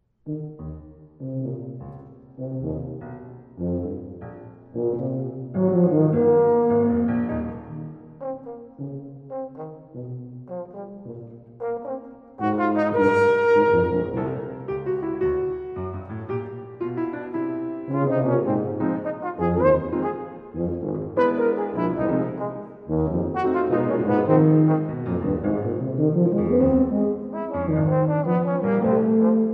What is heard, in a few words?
Suite for Horn, Tuba and Piano - In a Jazz Manner